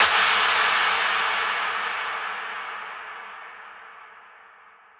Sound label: Clap FX Reverb Clap